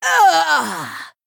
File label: Female Troll Death